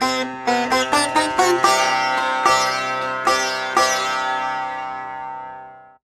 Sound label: guitar, Loops